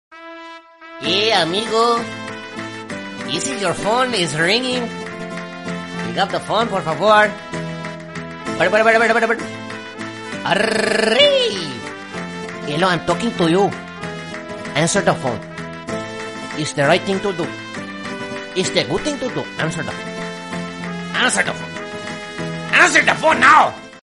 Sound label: Mexican sad tune ringtone